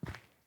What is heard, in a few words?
Grunt Boot Walk Default, Footsteps, Boots; Enemy; Sinecure; Melee Grunt